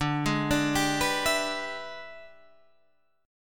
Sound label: E 7th Sharp 9th D, chord